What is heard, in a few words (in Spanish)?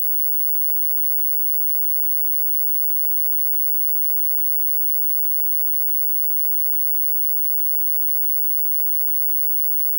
un televisor apagandose; power off